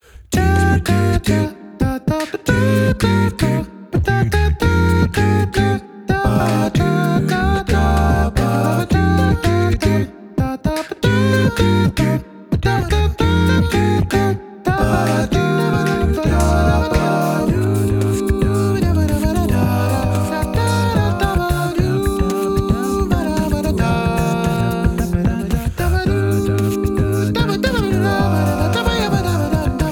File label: Gentle Breeze Acapella; KFAD; FIRST STRIKE